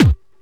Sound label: Hard Kick